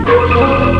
chimes; PSL Monthly Shareware CD-ROM (Public Software Library) (January 1994)

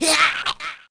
bskel hit; DAoC

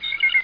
bird; Quake; PainKeep

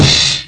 BAD cymbal crash

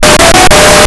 PHONE; Blue Heat

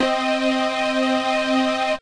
Teknostatic; Dance

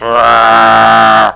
bear; Little Red Riding Hood; Koch; Storybook

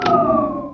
Brick Turned Off; Ricochet

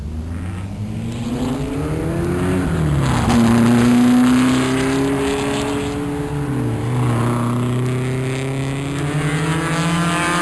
Racing Cars; Soundsation; Vehicles

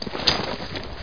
throw grenade; specops

